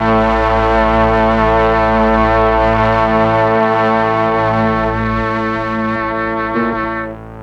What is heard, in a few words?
MEL STRING; ILIO; Propeller Island